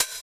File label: Hhat, Hihat; Equipped Music; Loungin House